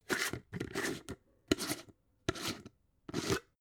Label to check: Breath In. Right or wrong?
Wrong